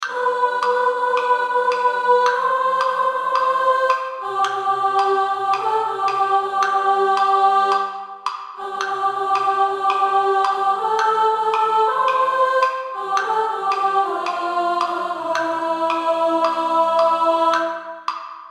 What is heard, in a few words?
Women Descant